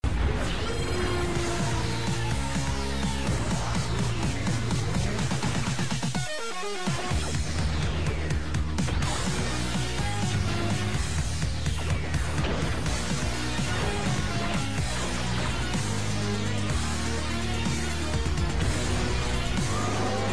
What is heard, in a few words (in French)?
musique generique instru